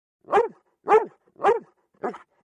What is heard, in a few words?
Saint Bernard Barks